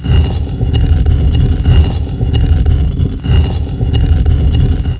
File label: sliding; valley